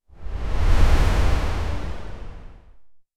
short astral dash sound

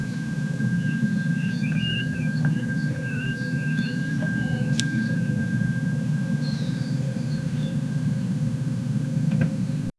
dreamy glowing flowers an; mushrooms

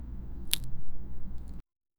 picking cotton; farming

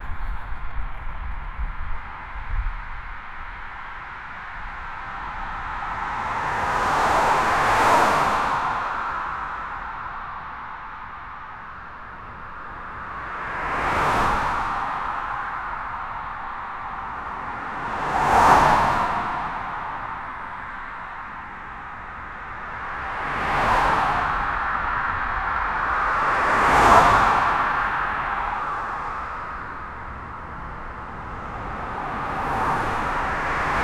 cars driving in a highway

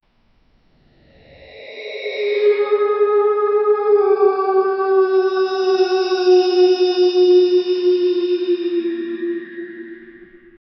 creepy

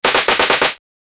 a firearm sound, gun

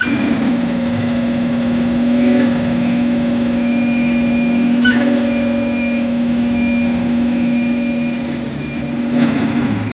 electric forklift driving

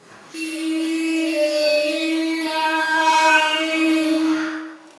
mysterious eerie and susp; magician

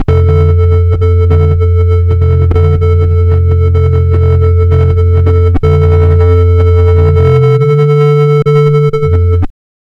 this sound is similar to, chiptune

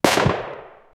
antique musket gunshot

